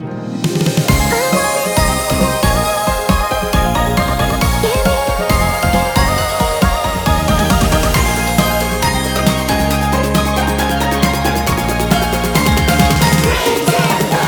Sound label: BGM ENTRY